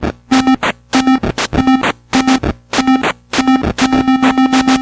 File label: boopin